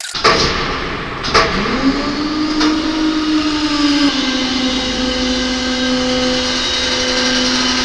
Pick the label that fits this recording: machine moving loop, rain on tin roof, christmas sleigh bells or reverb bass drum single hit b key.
machine moving loop